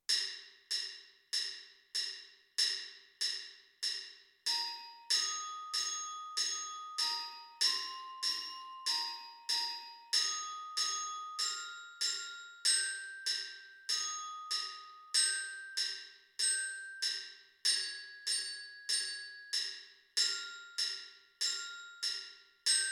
orchestra bells

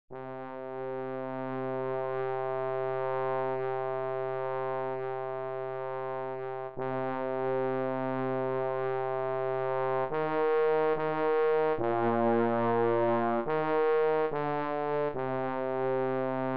trombone baritone slow; celtic air and dance

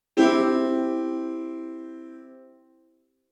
c major chord

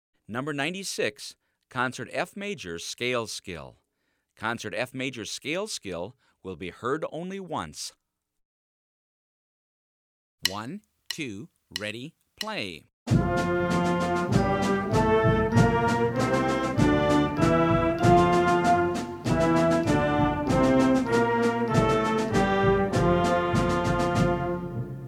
major scale

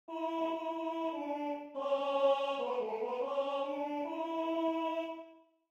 alto

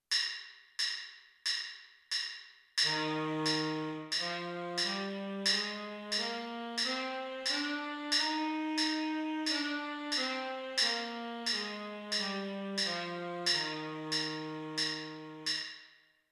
f major scale tenor sax

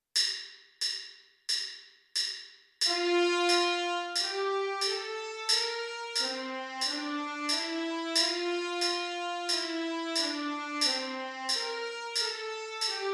g major scale trumpet

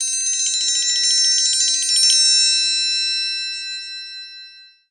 triangle

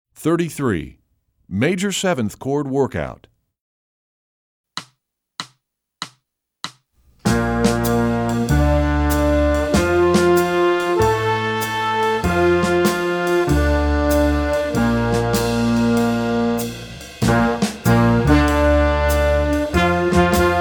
major seventh chord G

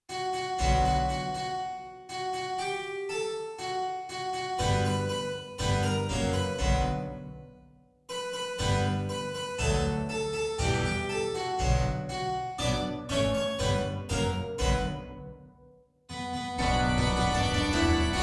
full instrumentation

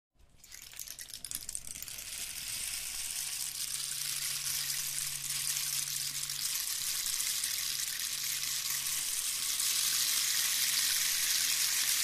rainstick

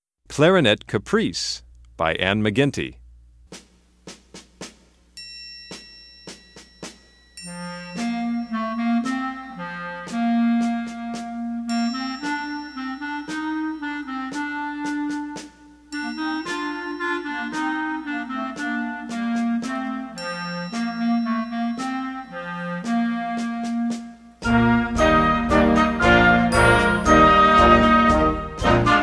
clarinet caprice

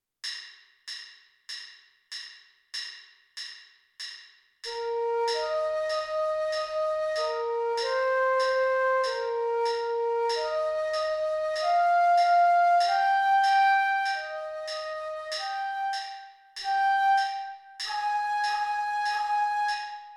flute

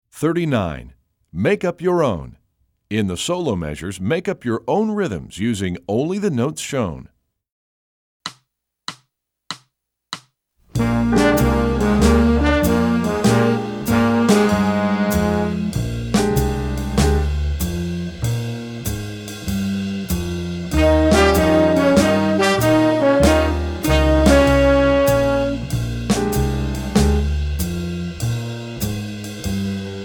improvise 2-bar solos using ro

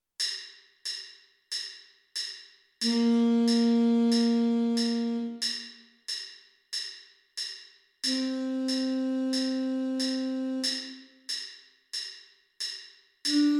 alto sax

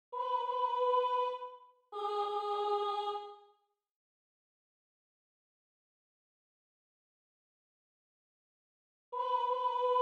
alto

